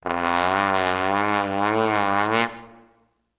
Trombone Gliss Up With Slow Vibrato

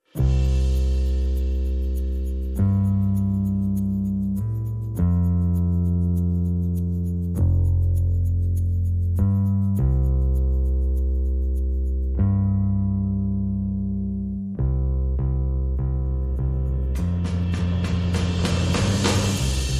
Drums and Bass Mix

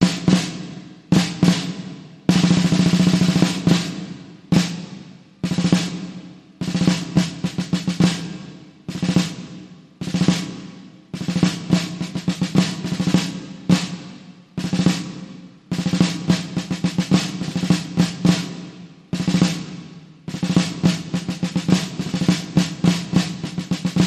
Solo Snare Drum; Halls Of Montezuma

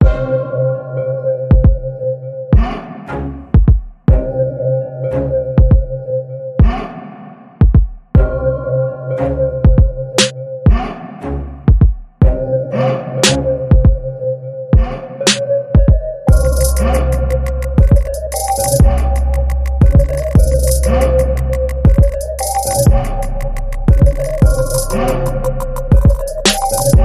Trap

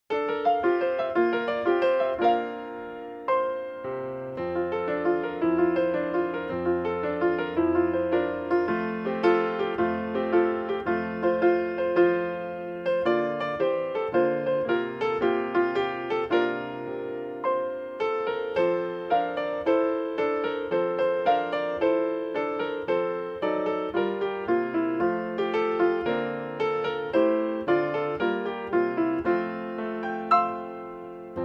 The Wassail Song, Solo Piano

